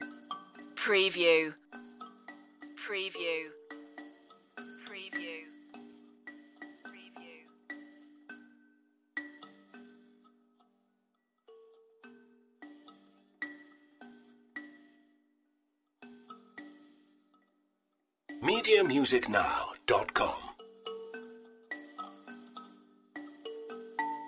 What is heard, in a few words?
safari lite loop